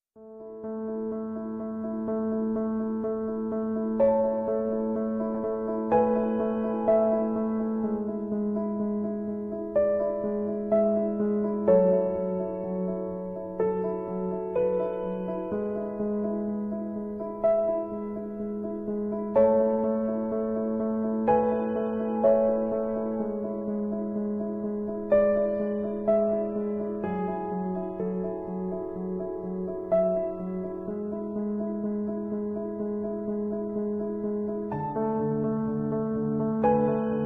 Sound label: romance, MiniMovie music; Asus